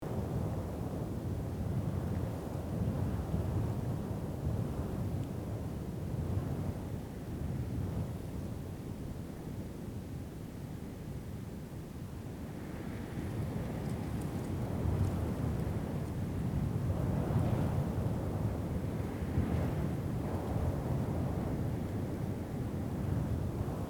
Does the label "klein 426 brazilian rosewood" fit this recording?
No